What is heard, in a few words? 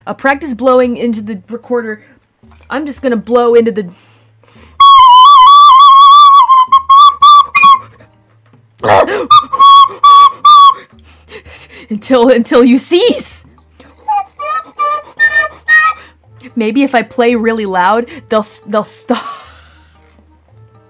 recorder; calliope; vtuber